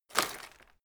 wood break drop

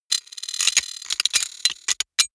robot rustle click

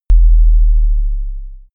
sub effect

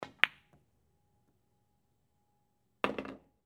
billiard hit into pocket

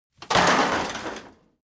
metal rock impact multi

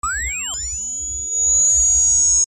action start signal

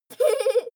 child laugh

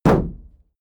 shield sheet impact dent soft

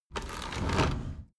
window roller shutter open soft single pull

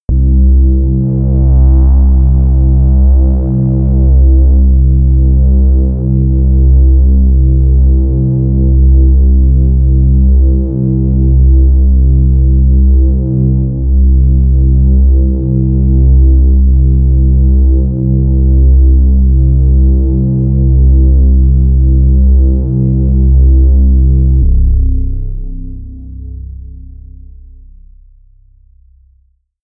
drone bass

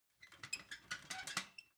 ladder metal handling soft

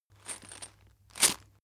chain mail movement

